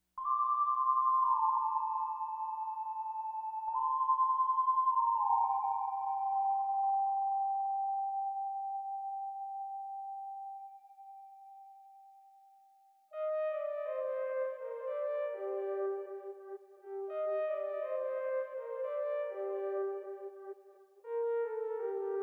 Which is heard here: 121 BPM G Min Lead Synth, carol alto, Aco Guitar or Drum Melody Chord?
121 BPM G Min Lead Synth